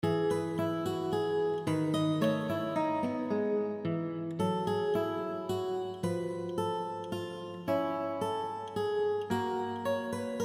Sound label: waterfall, guitar